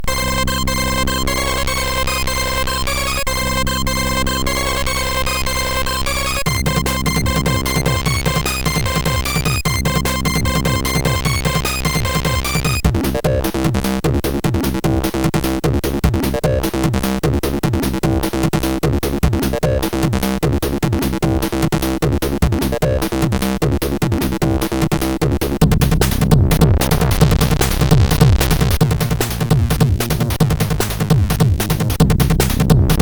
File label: sid, MOS6581R4; Woodka